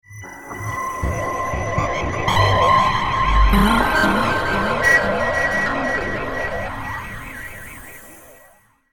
Rpg Style Game Ambience; Video Game Sound Effects; fantasy; role playing games, magic videogames

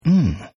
Mmmm Male, Exclamations; Voice

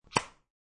Cell Phone Snaps Shut, Cell phone is snapped shut; Cell Phone Sound Effects; Electronics

